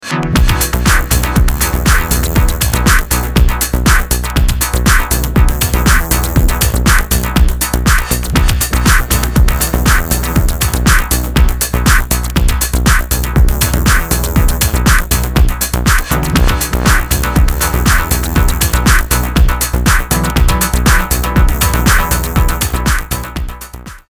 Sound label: Ringtone; Codebase